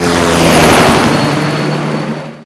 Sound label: plane flyover; mgsv